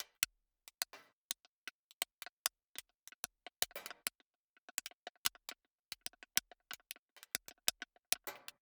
damage; World of Tanks

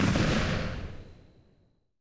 8mm tail close, guns tanks; War Thunder

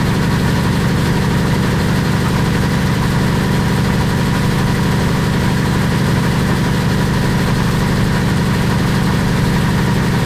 m1 engine rpm 99 load, tanks; War Thunder

